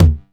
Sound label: 909 TOMS; Electronic; Wizoo - Platinum 24 Electronic